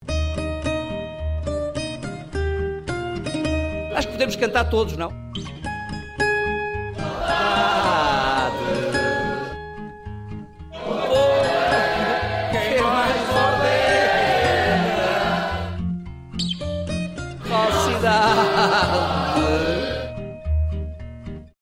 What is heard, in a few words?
ringtone